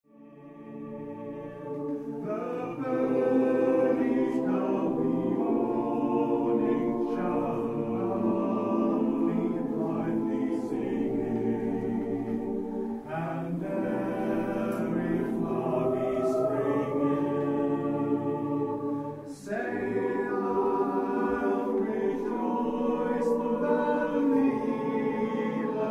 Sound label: SATB; Loud Blaw The Frosty Breezes